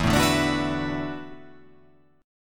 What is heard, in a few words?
E 9th Suspended 4th E down